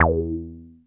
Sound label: JUP.8 E3, JUPITER 8, Rare Synthesizer; Hollywood Edge; Giorgio Moroder Rare Synthesizer Collection